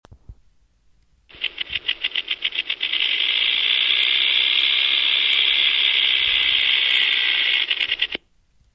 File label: rattlesnake